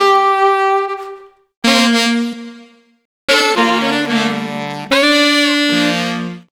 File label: Sax Funkstak; Sonic Foundry (Sony Creative Software); Knutrix Drum & Bass